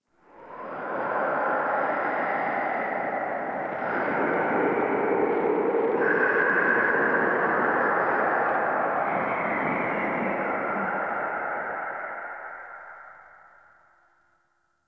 Whispers Dark; Warframe; Ambience; Entrati

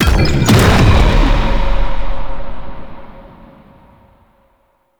Hazard Fracture Activate; Warframe; Duviri; Undercroft Hazards

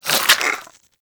Infested Throwing Star Reload; Warframe; Infested Throwing Stars

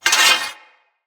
One Handed Axe Elite Equip; Weapons; Enemy; Axes; Sinecure One Handed Axe Elite

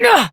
Jump Adult Operator; Warframe; New War

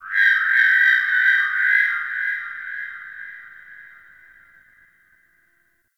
Texture Medium Two; Ambience; Warframe; Sentient Lair

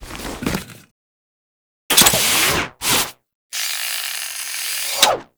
Arsenal Activate, Machines; Liset Machines; Tenno

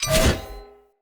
Cleaver Heavy Whoosh L to R, Swords; Weapons; Player; Bromius Cleaver

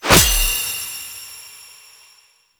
Parts Pickup; Warframe; Bard Quest; Mission Two

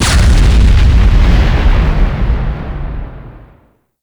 Shock Rifle Alt Explosion; Warframe; Weapons; UT Weapons

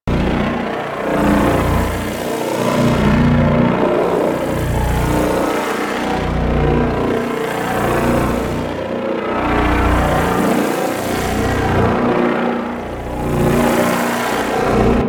Prismatic Shield Loop; Warframe; The New War; Archon; Boreal; Abilities

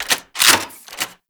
Fist Equip; Archwing; Melee; Grn Arch Regor Fists

